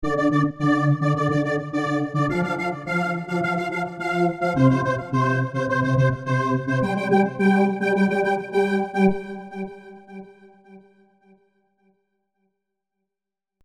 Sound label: serum seqs arp; chiqui audio